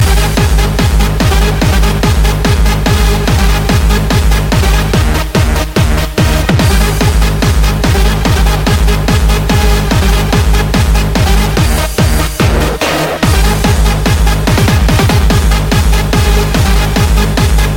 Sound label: bass